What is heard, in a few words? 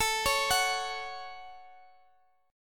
A 6th, Guitar, DADGAD